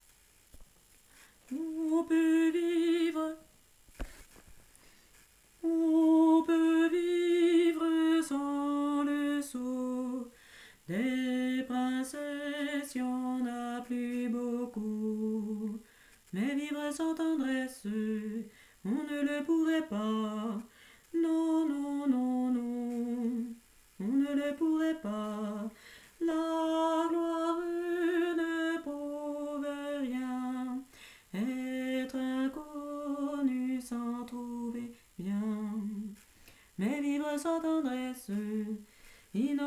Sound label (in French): alto